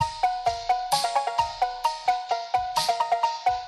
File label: Cowbell Loop